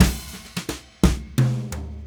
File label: Brushes SN HH